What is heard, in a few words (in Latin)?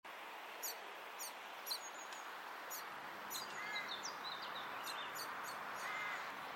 Fringilla coelebs; SETE